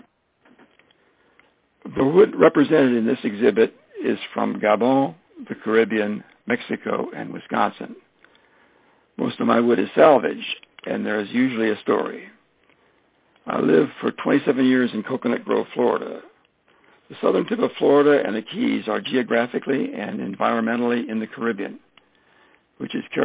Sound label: Browning cuban mahogany